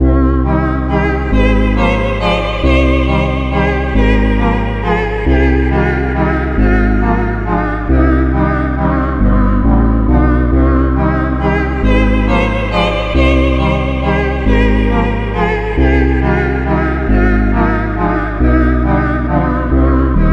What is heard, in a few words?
Lullaby (Performance); The Child; indie horror game